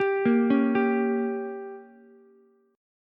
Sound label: G Diminished, Ukulele; arpeggiated; JGuitar